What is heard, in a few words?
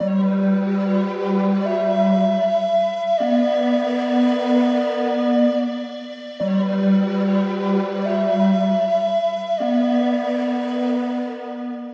Spirit Flutes; Rare Percussion